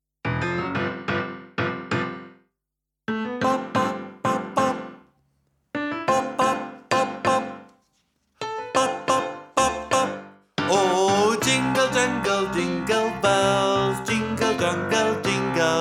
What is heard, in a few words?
JINGLE BELLS TENOR; ADIOSES SHOW CHOIR; THE CHRISTMAS SHOW